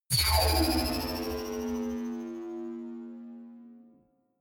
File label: energy field vanish